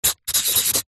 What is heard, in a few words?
pen sharpie sign signature; office